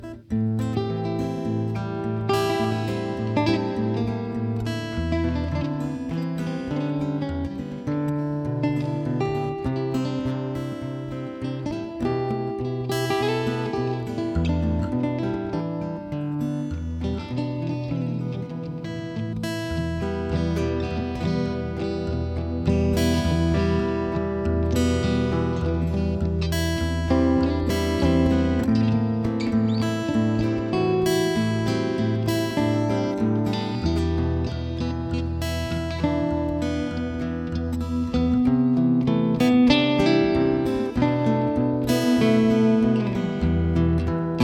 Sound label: stereo guitars